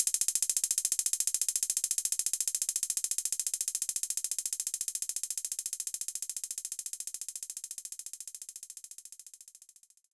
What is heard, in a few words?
TR 808 Hat; Knights Try